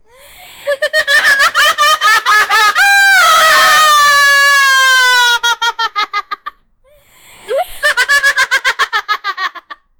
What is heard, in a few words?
kids laughing loudly